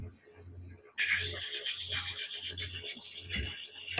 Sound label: Animal Wild animals Snake Rattle, vocal imitation